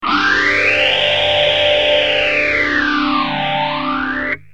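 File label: guitar tc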